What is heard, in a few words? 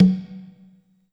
Low Conga